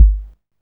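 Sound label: MPC4 bass drum; USB Soundscan vol.02; Underground Hip Hop; AKAI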